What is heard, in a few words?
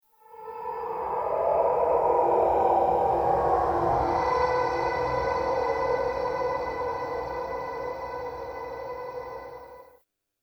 baby cry spectral blur